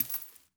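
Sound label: bullet case bounce 556 sand; gmod; viper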